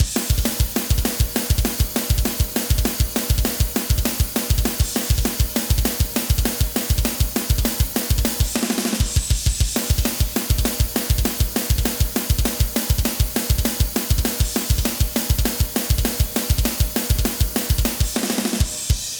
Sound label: fm drums